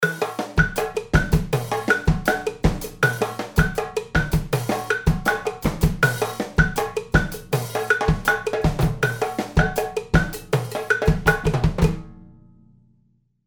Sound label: Guaguanco; fxpansion